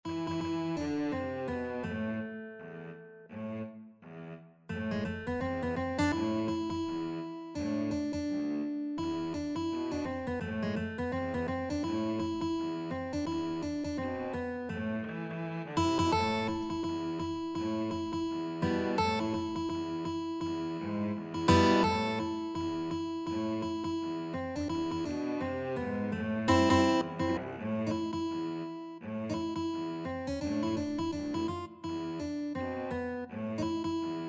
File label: guitar cello, guitar-cello duet; joshua fit the battle